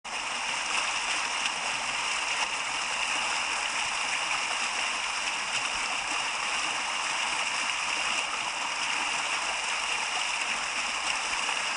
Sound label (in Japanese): seseragi